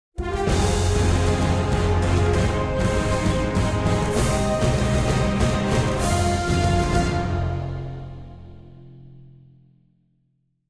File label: Cutscene Win